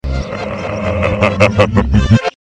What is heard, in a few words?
laugh, reverse